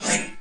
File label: ax swing; triple-aaa-games; insurrectionist